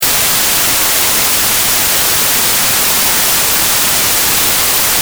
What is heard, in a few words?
white noise; testsignals